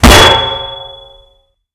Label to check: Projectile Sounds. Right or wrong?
Right